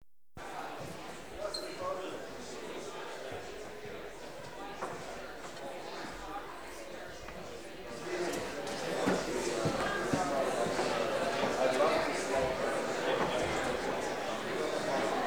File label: Audience Applause; Valentino Production Sound Effects Library; Office sounds